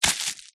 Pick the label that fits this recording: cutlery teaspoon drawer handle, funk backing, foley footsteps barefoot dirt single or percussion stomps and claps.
foley footsteps barefoot dirt single